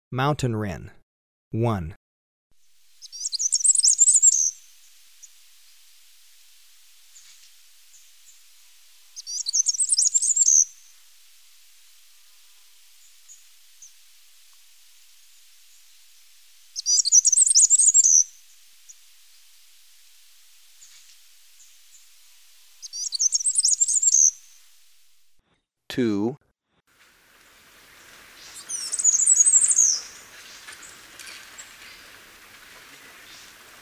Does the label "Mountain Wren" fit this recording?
Yes